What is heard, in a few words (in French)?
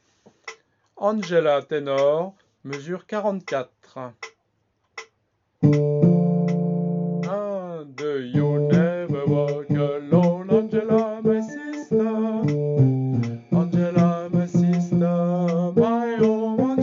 ténor